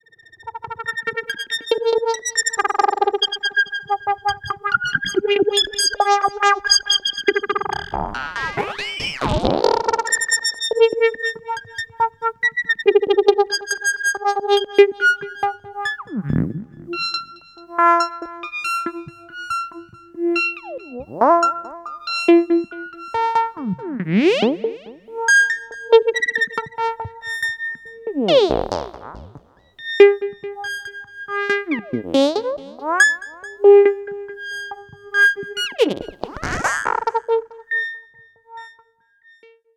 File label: ps3100 LFO Amp Modulation